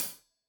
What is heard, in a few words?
Hat Tight, Hi-Hat, Cymbals